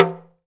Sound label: TALK DRM, Heart of Africa; Spectrasonics